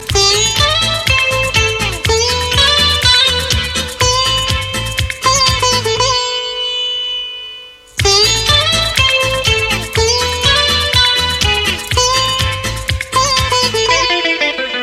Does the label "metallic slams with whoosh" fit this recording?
No